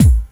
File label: BD, Dance Mega Drums, HIP